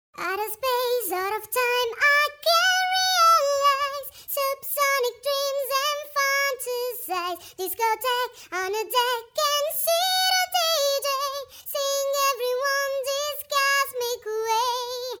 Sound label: Vox up fifth no env